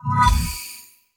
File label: metal Strike; metalStrike ii